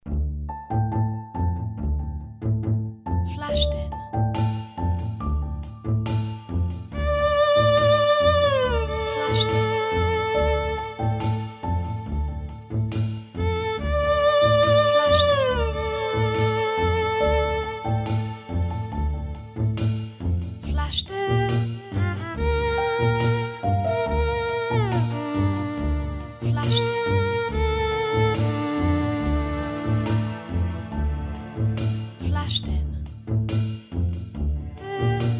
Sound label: midnight tango; adg3 sadpack2; adg3studios